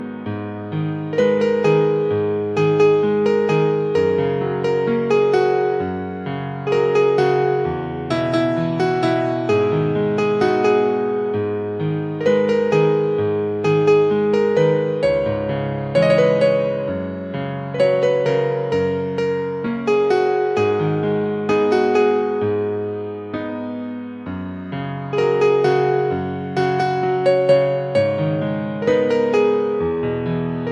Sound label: Piano